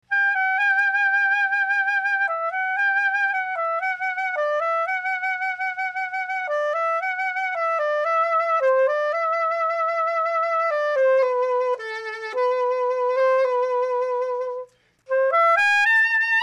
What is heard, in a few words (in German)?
sopran b flat; Buescher bflat Sopran; c-melody